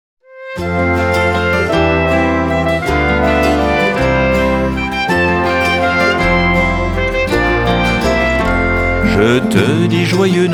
chanson pour enfant

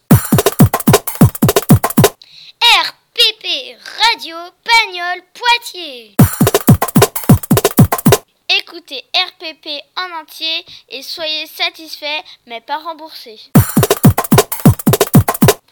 jingle

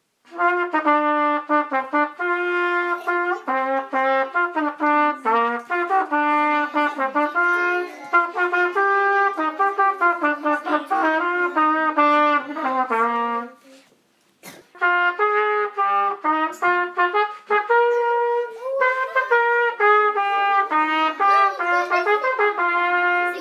morceaux de trompette

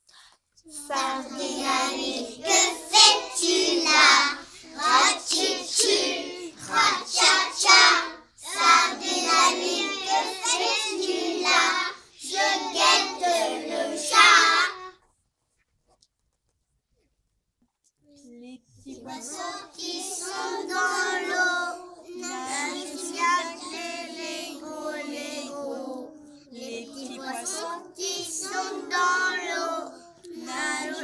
chants poissons

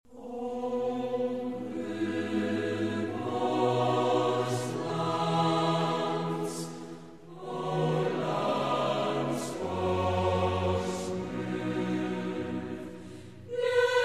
hymne national d islande

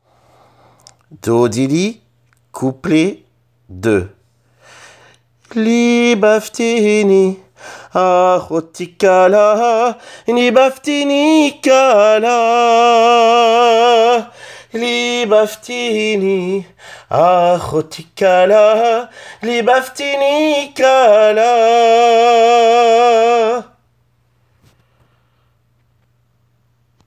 vocal

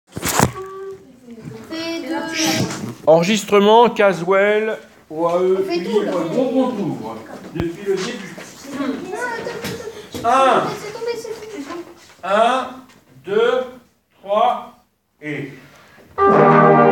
enregistrement tutti

